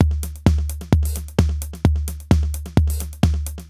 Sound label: Alesis HR-16B (130BPM), beats